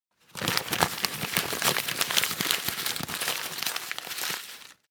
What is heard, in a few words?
rustling paper